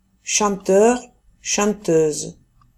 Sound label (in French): chanteur, famille; chanteur/chanteuse; cantante